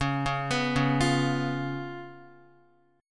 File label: C sharp 7th C sharp, Guitar; C#7; arpeggiated; JGuitar